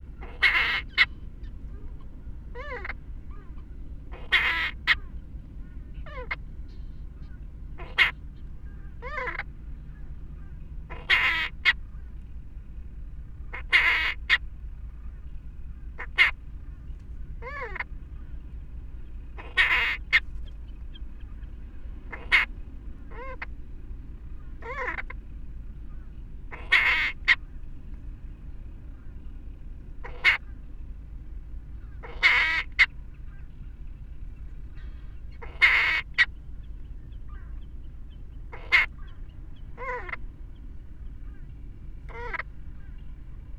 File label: Agonistic calls